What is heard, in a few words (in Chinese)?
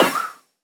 旋风人格挡弹开声